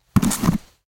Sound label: mask use; STALKER; IWP; item usage